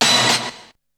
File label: Hit; ZERO G - Datafile DISC 2